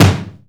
kick smack; E-MU Formula 4000 Series Vol. 2; OrbitPercMaster

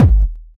Kick, Kickdrum; Equipped Music; Loungin House

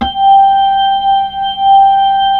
B-3, Hollow

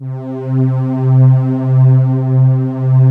STR SYN, SYN STRGS

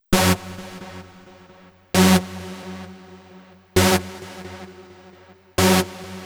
132 Saw; Zero-G Trance Inducer; Construction Kits; 132bpm

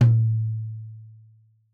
Tom2 Stereo, Deeply Sampled Drums; SMDrum

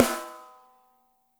dry, snares; Spectrasonics - Burning Grooves CD2

